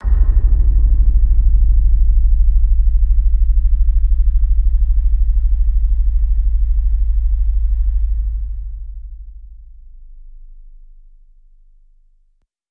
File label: Sub Fltr Riot Hum